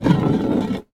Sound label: household deodorant can roll across table